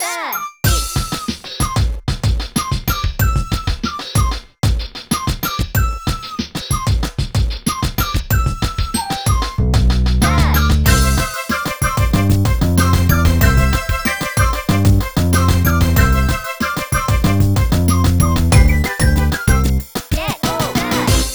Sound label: Music CASUAL; Super Scribblenauts 2010 NDS